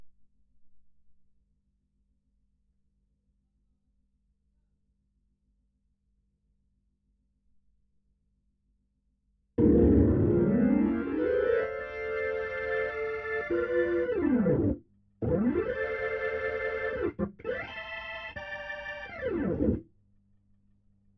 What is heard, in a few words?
HAMMOND BOT